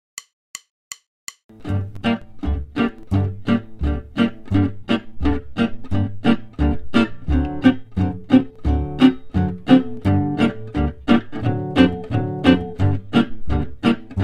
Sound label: backing track